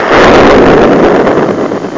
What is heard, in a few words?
flash; Amiga 8-bit Sampled Voice; shapez; tetris